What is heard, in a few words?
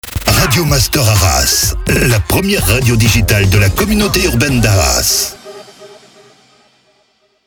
Jingle MEDIUM; Radio Master Arras; Habillage